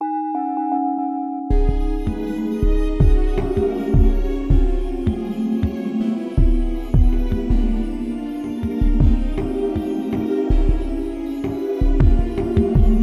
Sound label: Music